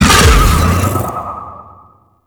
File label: Howl Attack; Warframe; Voruna